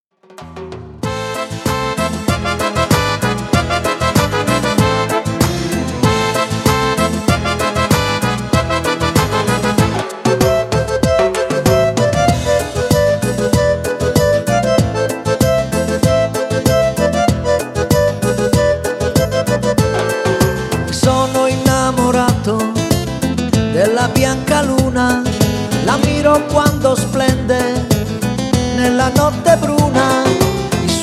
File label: Cumbia